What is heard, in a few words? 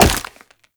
Wall Jump Ice; Footsteps; WallRun; Warframe; Characters; Tenno